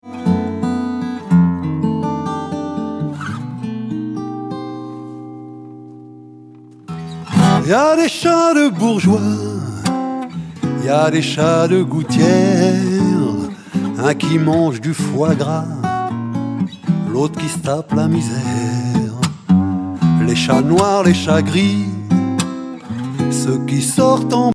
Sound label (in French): Miaou Blues